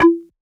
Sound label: perc toney, Hip-Hop Drum One Shots; Slate; CAICOS Hip-Hop Sample Pack